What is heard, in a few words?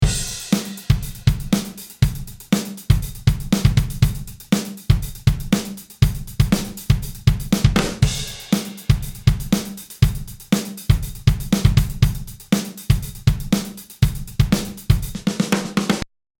Drumbeat